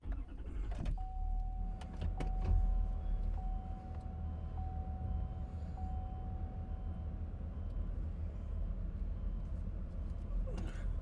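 chevrolet silverado tow truck onboard start slow drive brief stop interior; dpa4021; sonniss gdc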